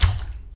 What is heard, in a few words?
bathtub step; Golden Crayon Sounds